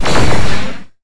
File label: transmute; equivalent-exchange-3